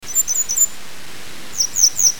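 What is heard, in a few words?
grey wagtail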